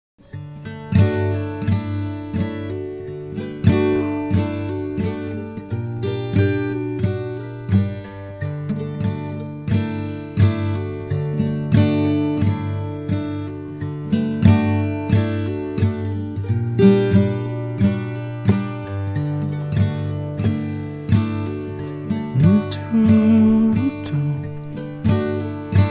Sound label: guitar and mandolin serenade